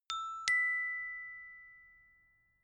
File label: notification glockenspiel fifth up